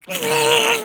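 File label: voice shock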